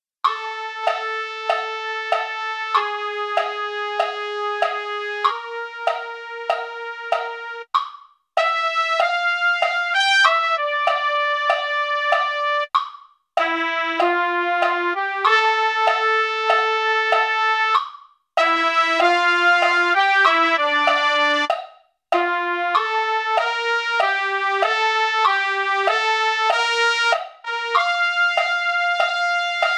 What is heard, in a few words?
trumpet, MIDI